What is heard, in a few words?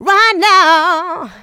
voc, female vox, Vocal House